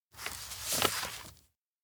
bookmark cover turn; anemoi

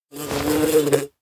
mount dragonfly; anemoi パッケージ版

